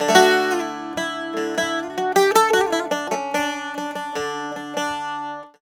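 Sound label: tzouras, D; TSOURA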